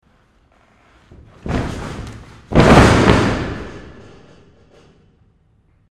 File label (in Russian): zvuk padenija cheloveka v sportivnom zale; Звуки падения человека